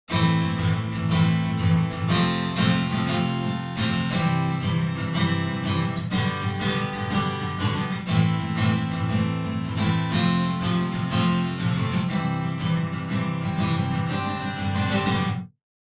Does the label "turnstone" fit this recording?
No